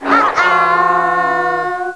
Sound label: death; quake2; laalaa